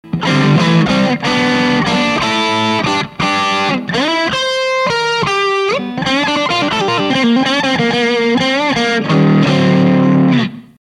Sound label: 4558, BOOST, RC4558P